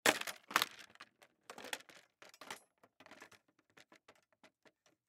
Hockey Helmet Down, Hockey Helmet Set Down Cement; Hockey Helmet Set Down Cement Roll; Hockey (Sound Effects:Sports)

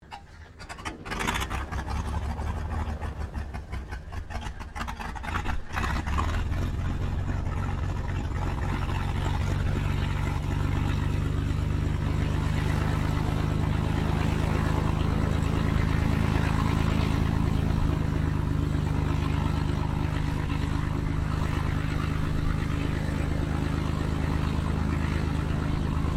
AIRCRAFT CESSNA O-1 BIRDDOG STARTUP IDLE TAXI; Cessna Birddog spotter aircraft; Airplanes; Vehicles

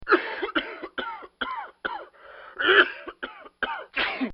Doctor's Office - Patient With Cold; Hospitals

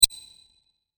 Rollover, Short; Mouseovers (Sound Effects:Multimedia)

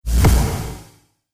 Cinematic Strike Power; sound design; trailer

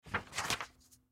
Paper Sheet Unfold Contract; Office; Blastwave

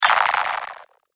Robotic Droid Noise; Robot Sound Effects

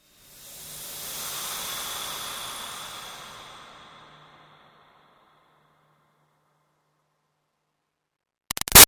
Swell Airy Toxin Steam Release Short; Sound Design; Swells

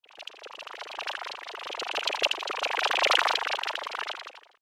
Liquid Alien Experiment; science fiction sound effect; Alien Sound Effects

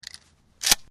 870 load, Remington 870 Shotgun Load; War; VRSonic

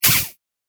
Robot Open Device, sci-fi robot sound effect; Robot Sound Effects

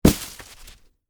Snowball Impact, Weather, Snowball, Impact, Big; BlastWave

